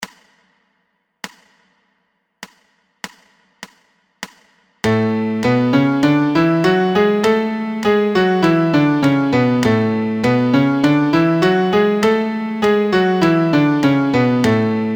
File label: MIDI Major Scale A 100